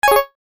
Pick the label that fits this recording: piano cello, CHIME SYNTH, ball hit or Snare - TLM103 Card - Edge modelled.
CHIME SYNTH